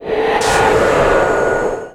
vampire groan

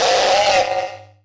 Damage; Blink; Mob

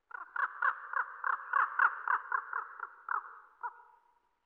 Bird, ambience

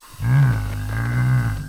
STONE BLOCK PEACE STAND

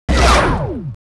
Metal elf Gunner attack

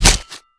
Spear Shell, Damage

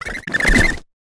THORNASH WAR ATTACK

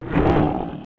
War idle

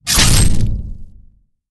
full swing

